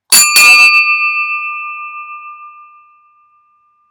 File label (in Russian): Kolokol zvon